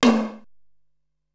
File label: Room Tom High, Ensoniq SQ-R-Plus